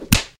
wood hit; Weapons; OldThink

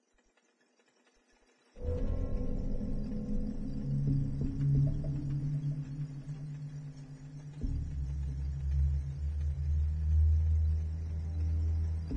station, Ambience

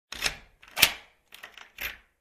lock, Keyhole

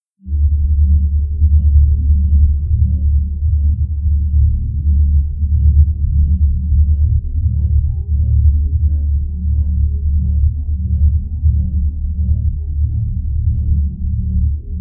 anomaly, Ambience; Anomalies

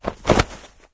body drop; Items handling

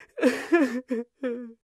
crying, Human Female; Voice

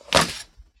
toolbelt drop; Items handling

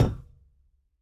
catwalk, Footsteps; Effects; OldThink

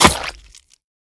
sabre hit; Items hit

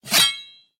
sabre equip; Items equip; OldThink

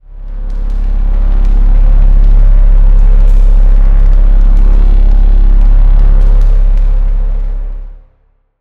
delamming, Supermatter

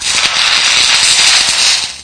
welder2; OldThink

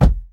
hulk step; Effects

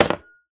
drink glass drop; Items handling

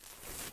walk3

